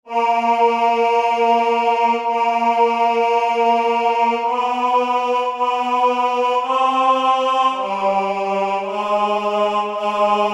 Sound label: tenor